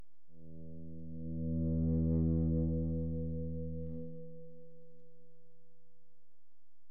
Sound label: Feedback